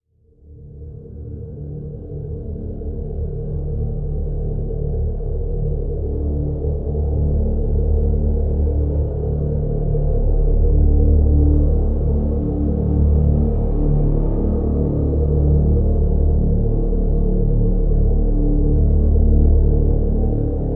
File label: Drn Gauntlet D